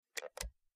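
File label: Low pitched Mouse Single, mouse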